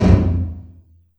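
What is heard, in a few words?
large room 909, reverb; TR 909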